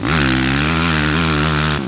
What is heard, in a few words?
power, Engine